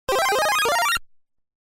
1-Up Mushroom, Sound Effect; Super Mario Bros

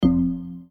Button Stop; slots; ui

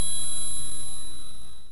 tinkle

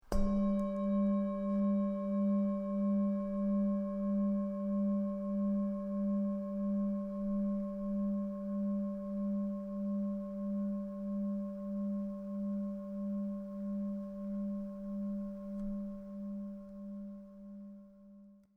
Bowl

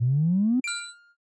balloon heat

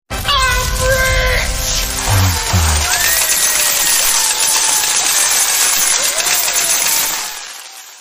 Jackpot

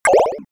login

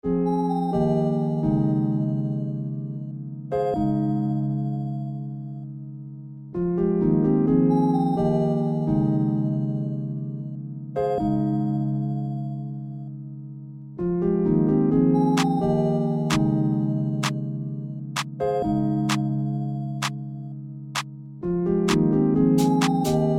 arp

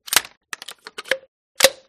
flare gun reload; resurviv

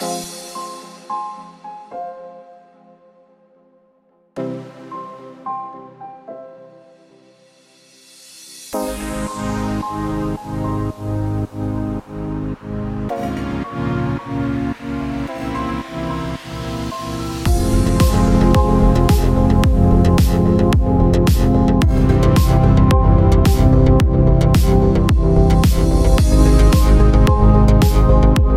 Emotional Electronic

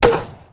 smg, guns

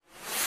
swosh short

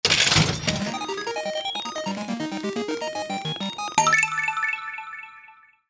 sfx spin to stop

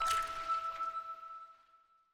drop

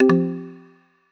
Times Up

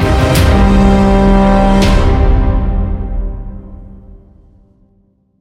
outro Free Spin; asgardian stones

